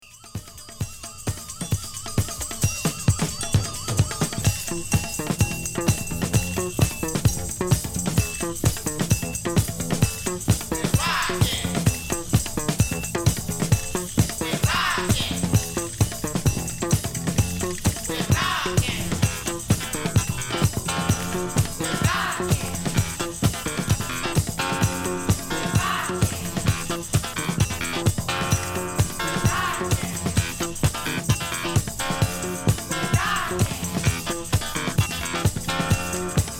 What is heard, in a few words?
rock spank freak (instrumental); disco